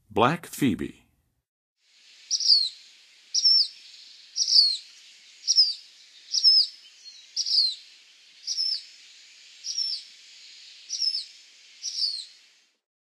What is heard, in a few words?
Black Phoebe, Songs of Birds; North America; Western USA; Western USA Songbirds